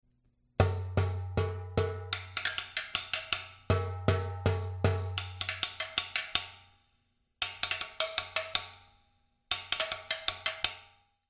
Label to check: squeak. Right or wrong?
Wrong